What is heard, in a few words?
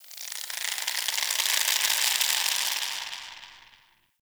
Under City Rope Creak